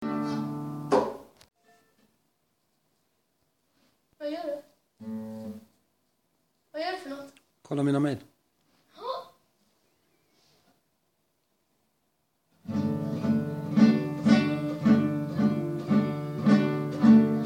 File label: plays the guitar; hasselby stockholm